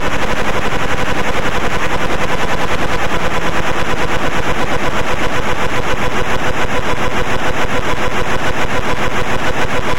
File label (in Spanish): la electronica